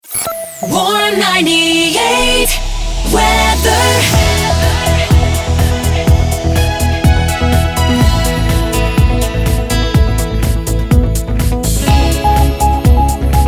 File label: Weather 124bpm A Main ID; Warm 98